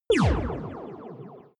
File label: single laser blast; krieg-waffen; soundpool